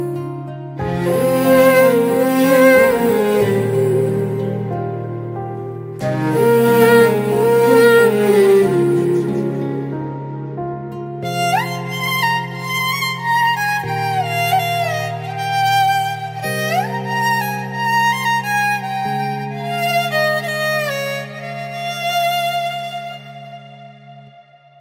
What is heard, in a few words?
Piano Music